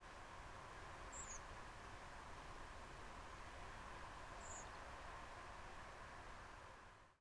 freak shape, redwing

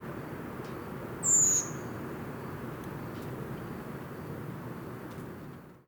hoarse, redwing

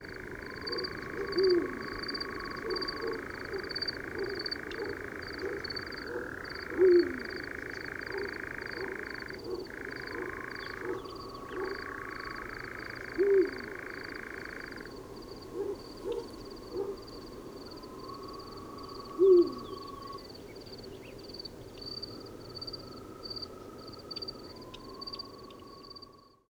Byzantine Eagle Owl Hooting Of Male

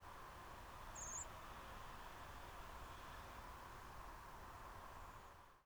ascending, redwing

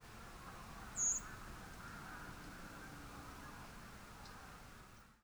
ascending nfc, redwing

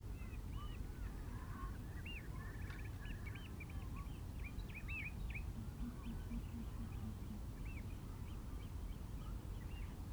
Short eared Owl Male hooting in flight; asio flammeus

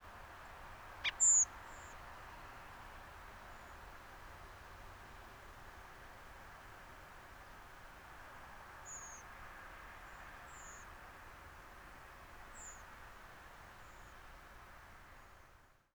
gak zeeer, redwing

